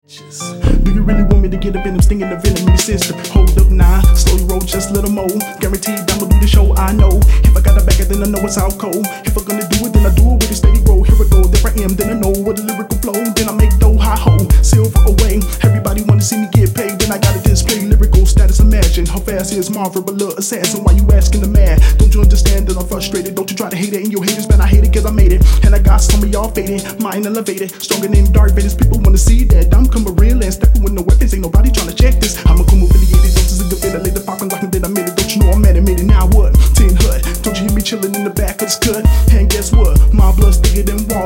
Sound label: hiphop